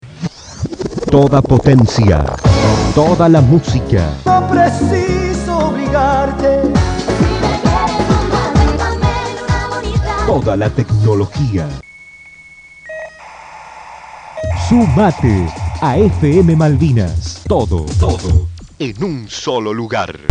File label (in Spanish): Jingle Radio